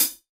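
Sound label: hi hat closed, drum machine; EdgeSounds; Drum Mashines VOL-1; R-100 DRUMS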